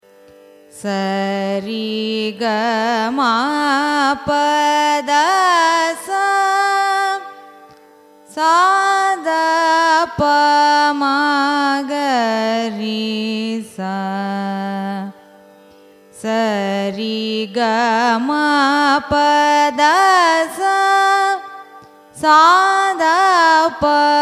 raga vijayanagari arohanam avarohanam; carnatic music

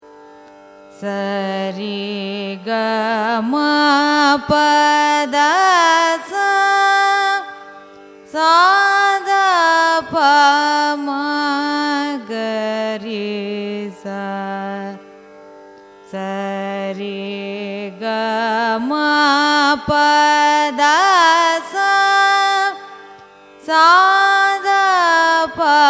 raga chandrajyoti arohanam avarohanam; carnatic music

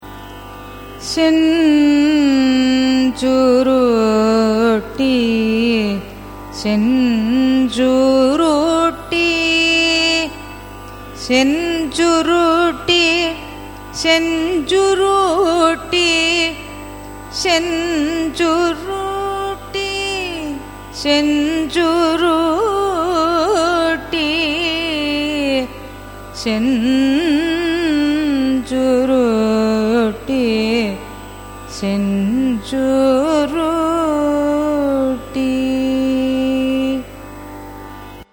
raga senjurutti signature; carnatic music

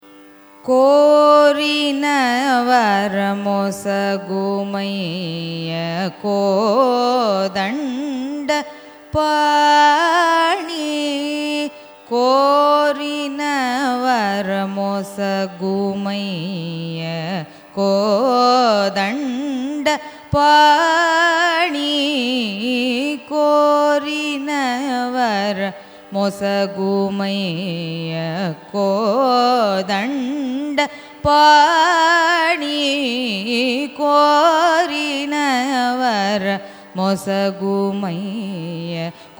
raga ramapriya carnatic; carnatic music

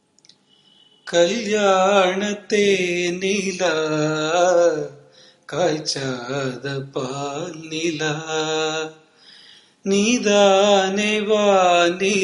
raga darbari kanada, carnatic music

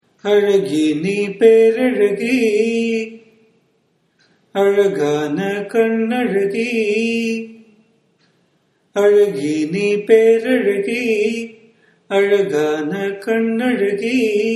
raga kuntalavarali, carnatic music

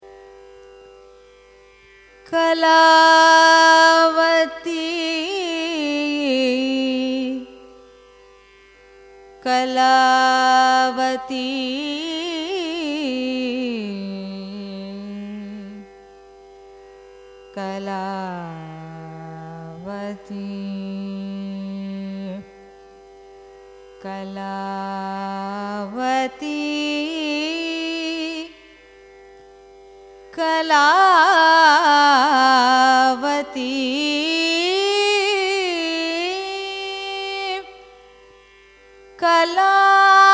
raga kalavati signature, carnatic music

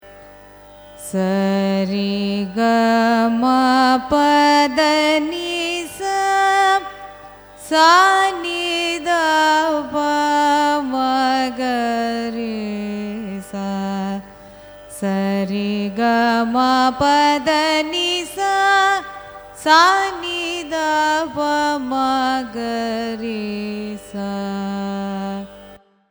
raga sindhu bhairavi arohanam avarohanam; carnatic music

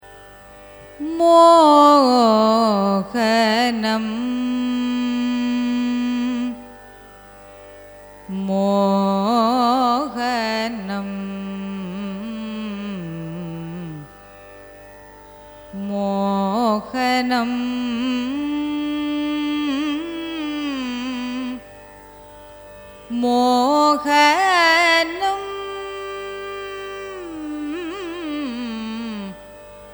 raga mohanam signature; carnatic music

